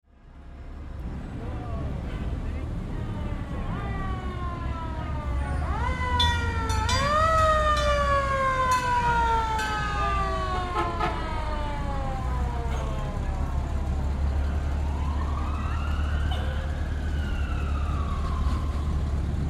Peaceful City Demonstration, Crowd in city with bells and horns; some traffic. Various sirens. No organized shouts or chan